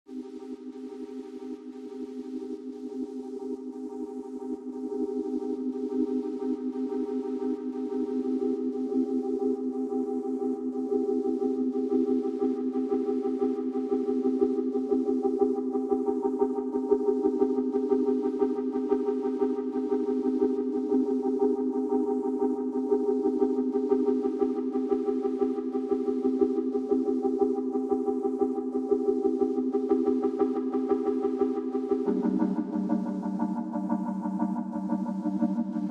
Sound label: Synth Only